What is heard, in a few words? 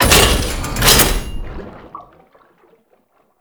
Vial Hazard Restock Tube; Gameplay; Hazards; Warframe; Entrati